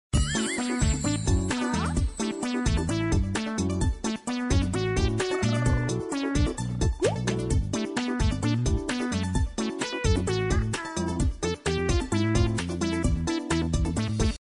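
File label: cheerful looped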